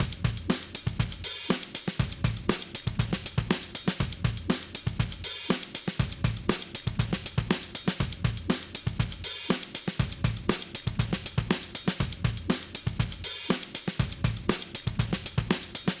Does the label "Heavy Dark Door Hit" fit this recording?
No